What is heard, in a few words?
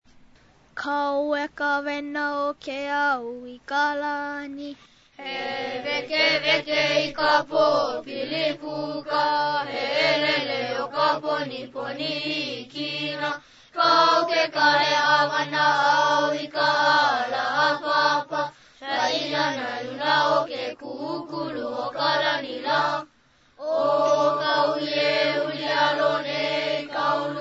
Opening Chant